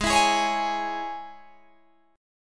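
Ab Suspended 4th Sharp 5th chord, Guitar, Open G tuning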